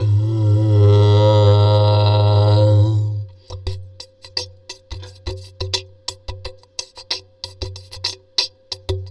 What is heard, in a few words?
Djembe cheap mic session